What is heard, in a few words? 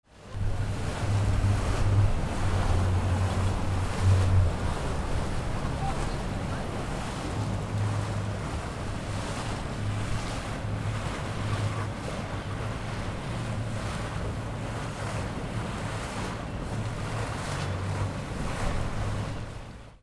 BOATS